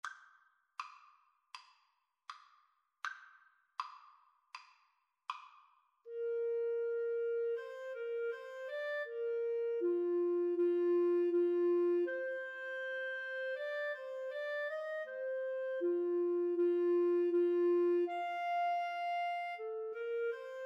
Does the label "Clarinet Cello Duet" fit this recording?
Yes